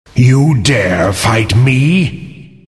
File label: attack; Invoker